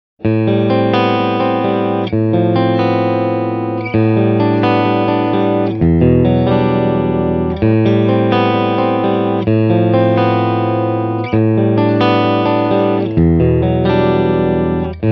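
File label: clean vintage combo, Guitar Dry Sound – Guitar Wet Sound – Guitar + Band; USA Vintage Combo ’67 Clean (Mic Pre Amp Tube Manley); From 0″ Guitar Dry Sound – From 15″ Guitar Wet Sound – From 30″ Guitar + Band; Kemper Profile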